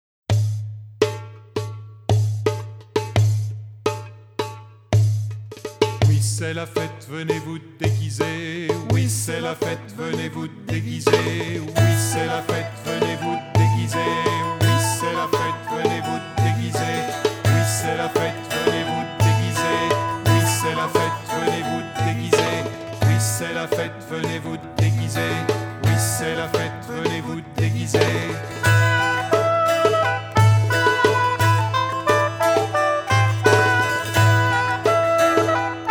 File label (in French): venez danser instrumentale